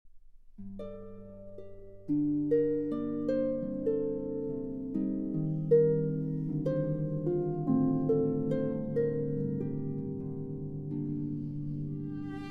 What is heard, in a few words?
Love Theme, Harp & Orchestra